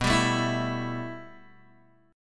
B 7th Suspended 4th Sharp 5th B down Guitar Open G